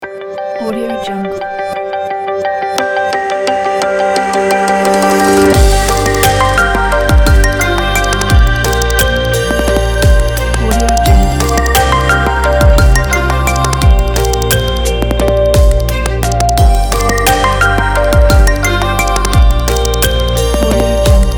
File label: tech energy kit